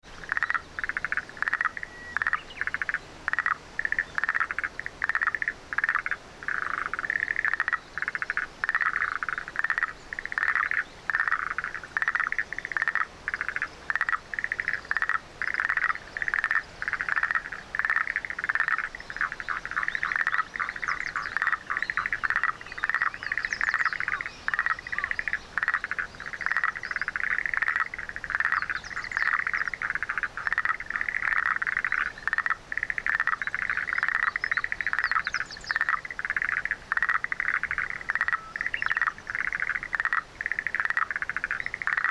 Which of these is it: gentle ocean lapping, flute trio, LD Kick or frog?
frog